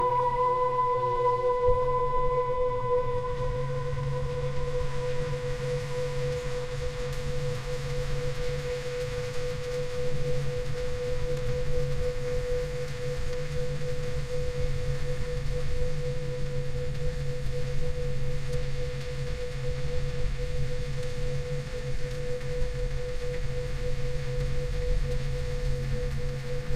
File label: ambient meditation